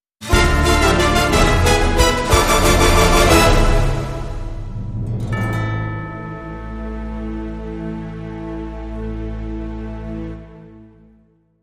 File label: Sting No Choir